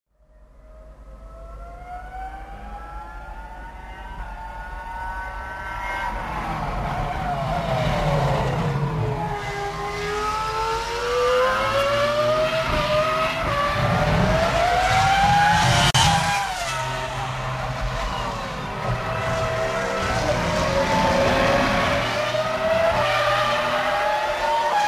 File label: mclaren f1 sound; McLaren f1 sounds